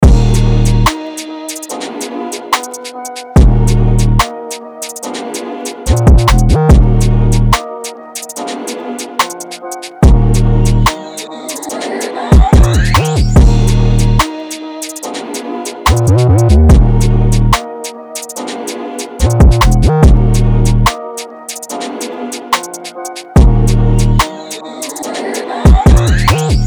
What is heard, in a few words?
Ching 144 BPM Ebm